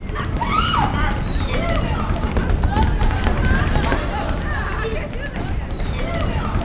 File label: UY STAMPEDE, Vocal Planet Gospel, JUNGLE; UYC JUNGLE